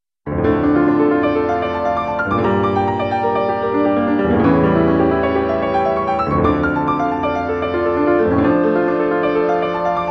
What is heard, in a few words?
etude piano